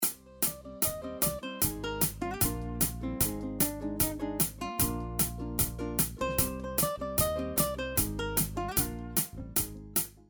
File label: Aerial clip with click, ukulele